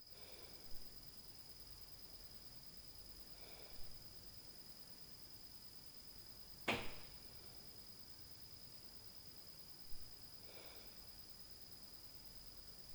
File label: Night Noise